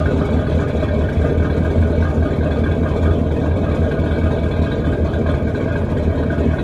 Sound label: V6 Chris Craft Boat Id, boat engine